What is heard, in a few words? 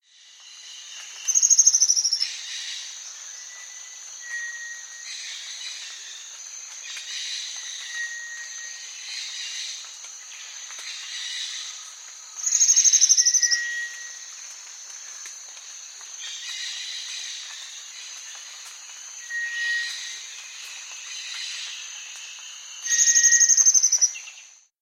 Brown-Backed Antwren, Nature's Songs, S. America